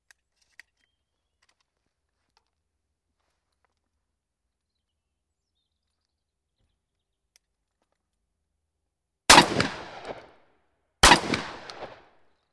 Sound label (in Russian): pistolet 12-go kalibra samozaryadnij strelyaet